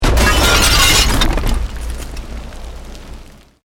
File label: Explosion Medium Brick Wood Glass